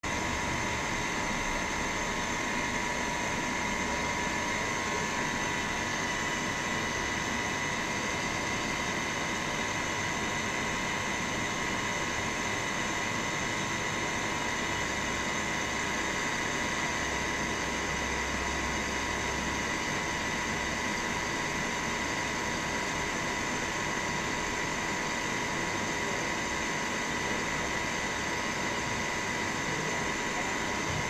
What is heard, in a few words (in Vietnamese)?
tieng may bom nuoc chay